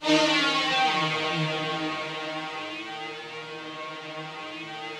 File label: RGHSTR3 D#2, Yamaha VP-1, ROUGHSTRNGS; 90 s Sample CDs; Music Store